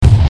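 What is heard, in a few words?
explosion; platformer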